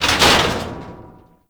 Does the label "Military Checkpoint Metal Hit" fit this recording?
Yes